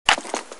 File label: pick up object